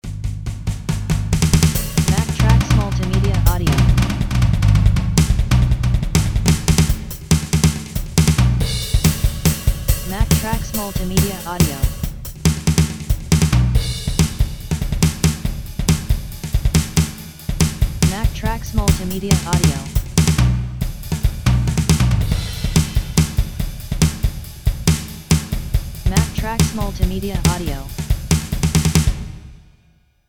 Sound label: drumkit vintage